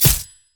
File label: lunge oh; LoL; fiora